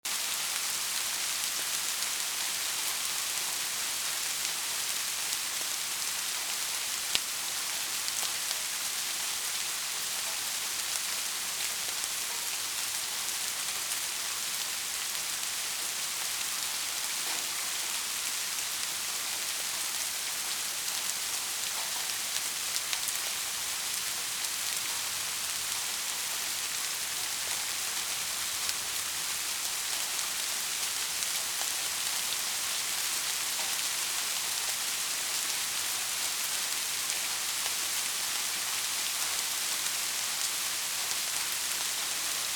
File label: crystal clear rain sound